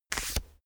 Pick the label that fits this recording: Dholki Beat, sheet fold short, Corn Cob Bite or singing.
sheet fold short